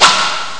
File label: disc throw; ST-XX Amiga Soundtracker Sample Packs